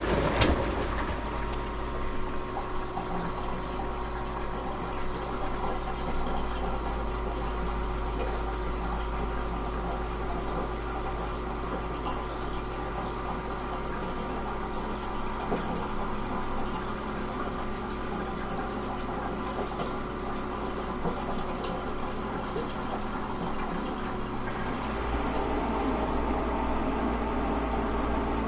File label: Ambiance Watery, washing machine